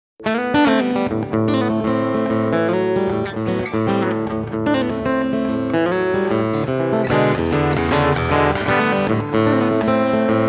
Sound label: Intro Guitar DI